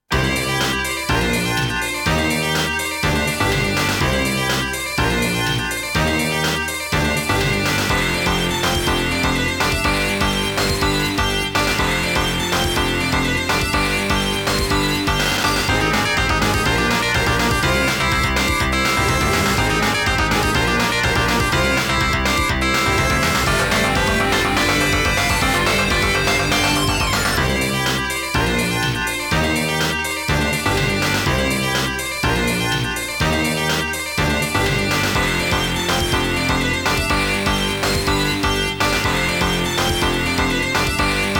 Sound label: 3D Stage BGM